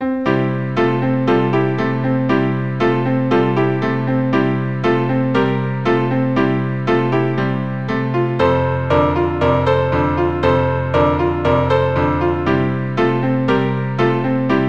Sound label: Cornflake Blues, keyboard